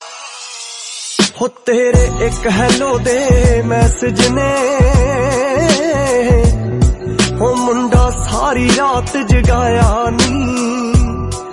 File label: Punjabi Ringtone